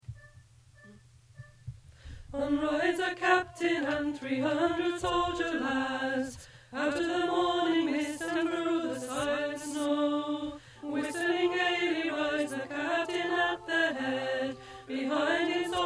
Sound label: Alto